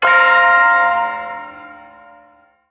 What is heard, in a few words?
Shawzin Hirajoshi Chord; Warframe; Duviri Ersatz Shawzin; Chords